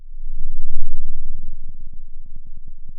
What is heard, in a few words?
pop, Sound of a thunderstorm